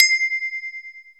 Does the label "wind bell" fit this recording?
Yes